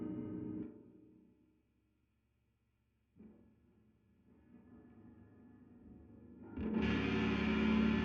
GTR Royer

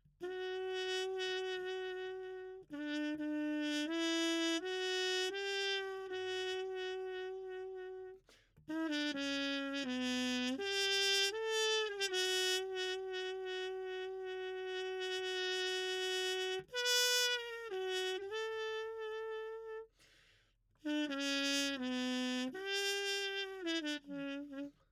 Trumpet

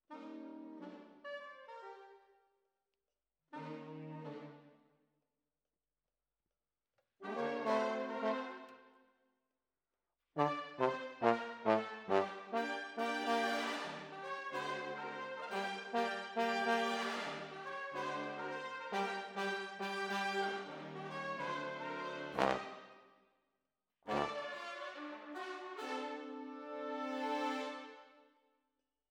Tromb ASS

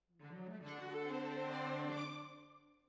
Cellos Over

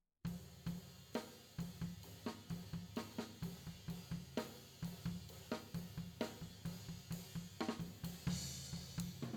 Floor

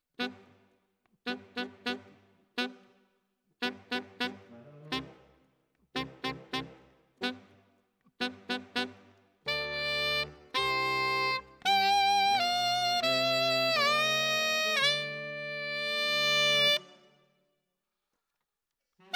Alto, RNR for piano

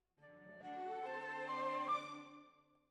Clarinet

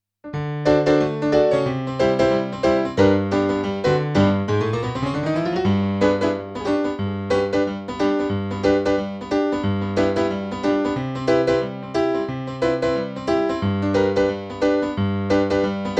Piano, RNR for piano

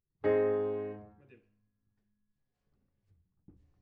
Piano A

